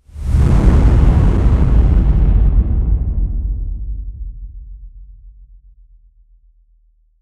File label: punch; marne wala panch